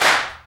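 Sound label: CLAP, left; East West - Phat & Phunky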